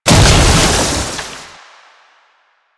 Stalker Jade Scythe Proj Die; Warframe

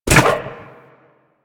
Zipline Brake Foley Contact; Gadgets; Parkour; Warframe

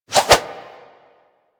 Staff Swing Spin, Weapons; Warframe

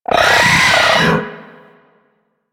Arachnoid Berserk Vocal Attack; Enemies; Vocals; Warframe; Corpus; Arachnoid Hunger

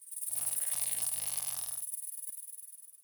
Day Insect Close; Ambience; One Offs; Glades Of Andurin

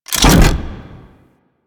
Extending Bridge Stop; Warframe; Ambience; Corpus Gas City; Gameplay Remaster

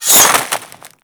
Hammer Success Pull; Warframe; Grineer Hammer Boss; Foley

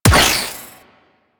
Sniper Proj Explode; Sentient; Crp Sent Amlg Sniper

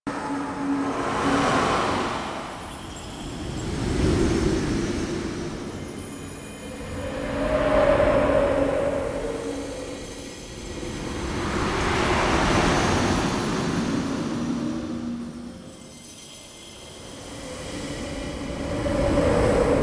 Ambient Bed Loop; Warframe; Warframe Intro Choice

